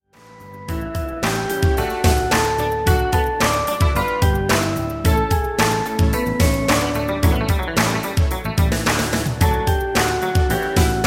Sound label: hahaha song